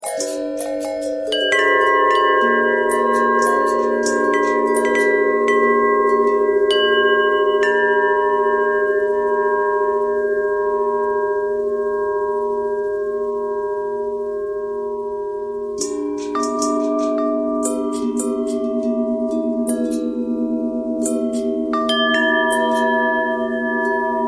bell long bell thread noise, bells

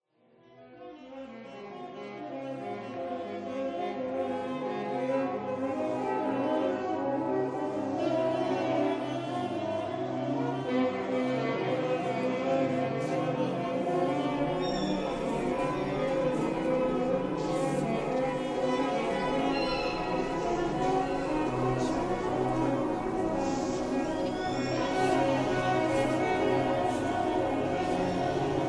clarinet jazz thread noise; clarinet geese

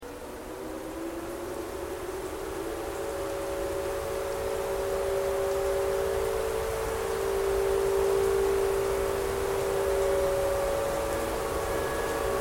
tornado siren thread noise

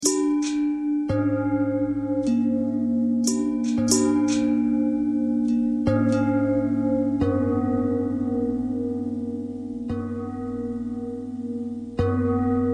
bell long bell thread noise, bells

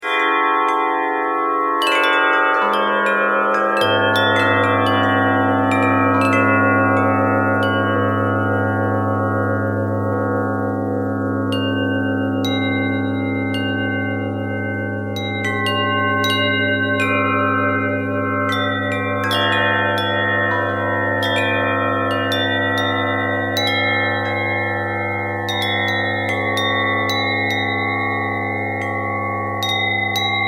bell twist

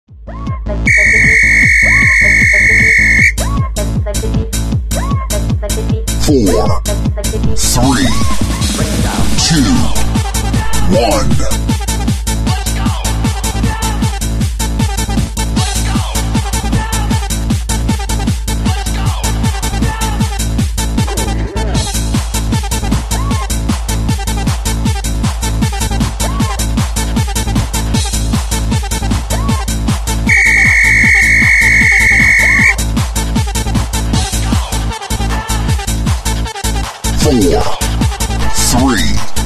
The Beat

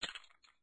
connect, bubbles; bubble shooter; bubbles arcade; gameplay

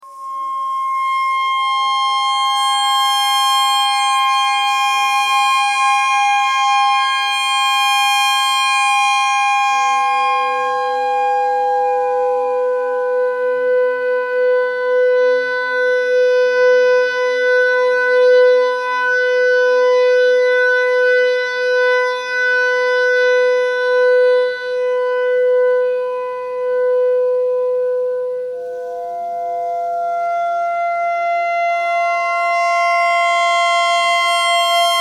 clarinet notes e clarinet notes e thread noise

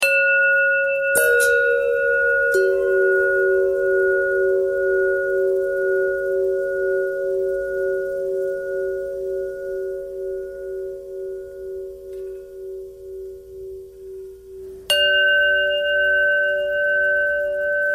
long bell bell bend threads; bells

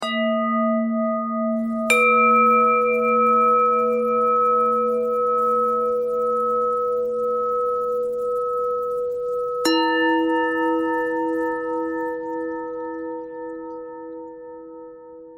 bell thread bend thread

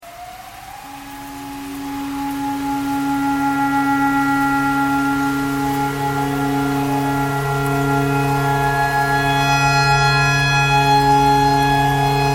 clarinet tornado siren pentatonic all

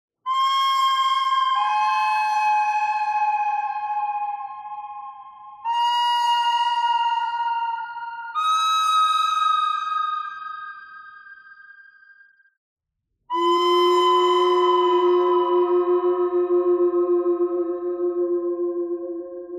bird thread pentatonic; noreverb

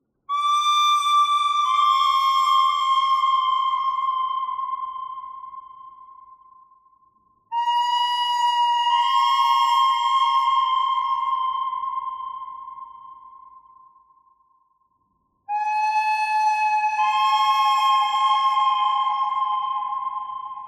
bird thread buzz

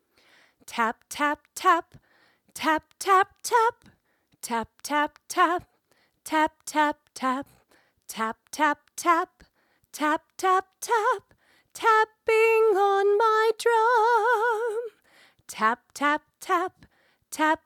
Tapping On My Drum

